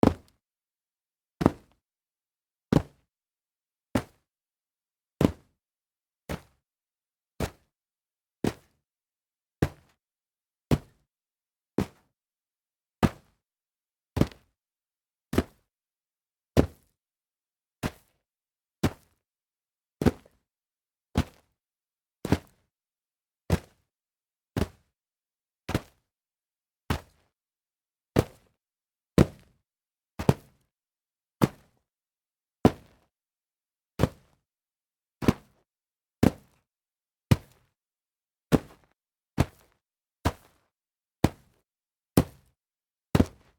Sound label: jump on dirt